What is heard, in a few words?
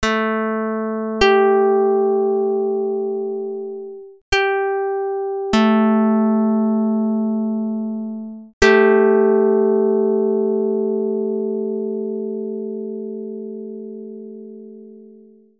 pluck 220; Jid 25/14